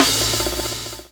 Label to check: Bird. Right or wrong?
Wrong